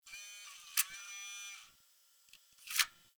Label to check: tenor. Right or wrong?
Wrong